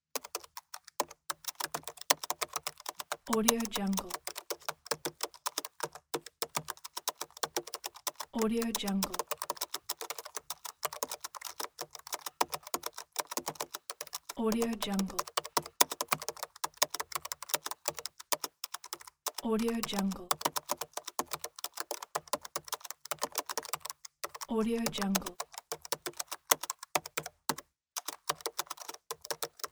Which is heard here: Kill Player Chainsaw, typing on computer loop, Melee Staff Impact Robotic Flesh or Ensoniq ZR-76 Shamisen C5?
typing on computer loop